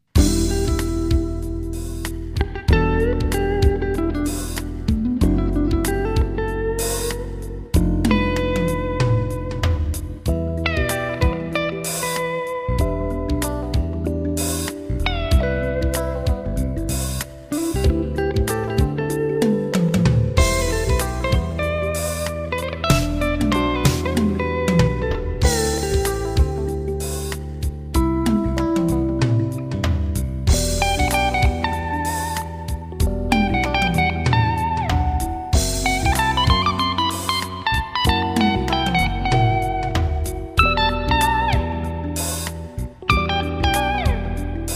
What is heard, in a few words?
Fusion